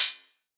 small reverb hi hat closed sound f key; tuned drums f key

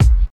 clear bass drum one shot a sharp key; kick drum samples

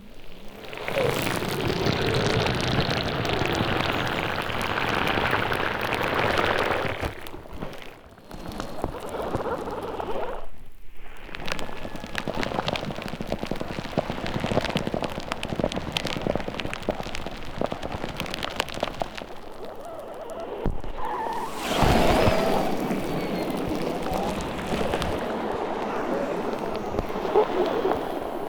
hydrophone recording of burning embers underwater; soundscapes

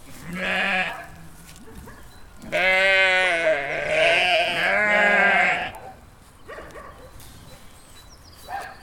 sheeps baaing dog in background

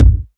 original kick drum sound g sharp key

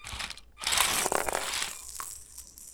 lego toy with small plastic in - sand; field recording

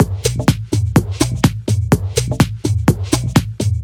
tech house fills and pluck; drum loops

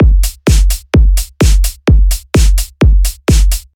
tech kick and snare sample; drum loops

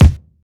good bass drum single shot e key; kick drum samples

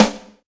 snare sound g sharp key

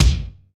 kick one shot c key

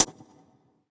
large room high hat f sharp; large room high hat f sharp key; hat samples

